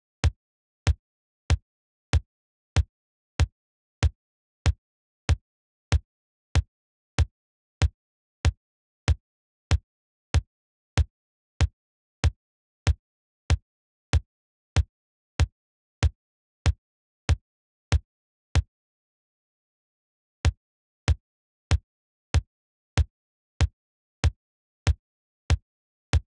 Sirens

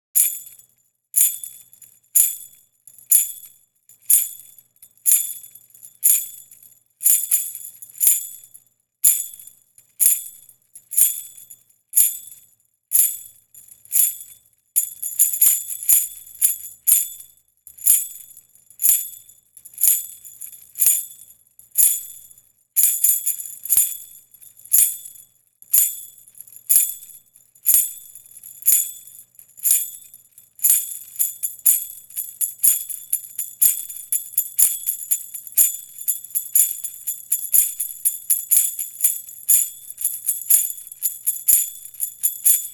little guitar sample

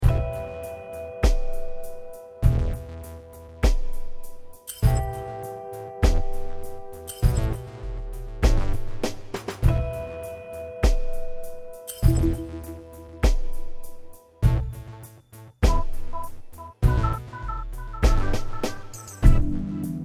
Em100 Nu Groove

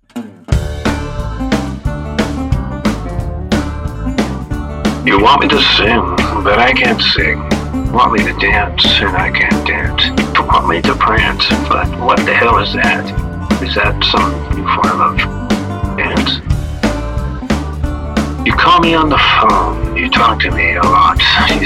Can t Sing Blues

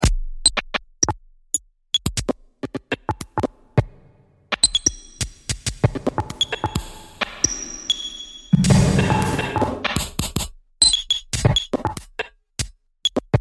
Gritty Glitching; Alchemy 03

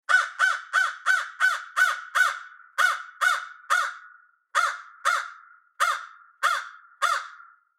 American Crow (Field Recording)

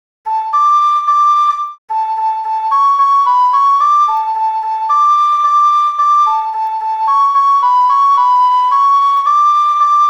Guitalele

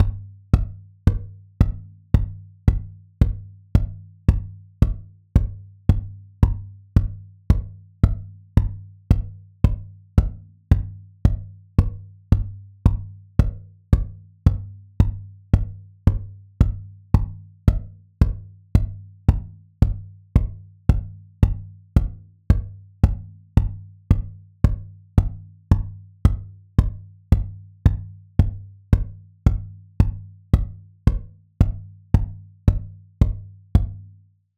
Kick

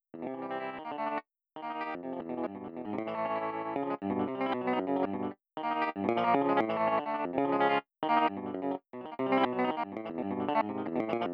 guitar

